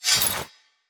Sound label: Talisman Stealth Hit; Raiders Talisman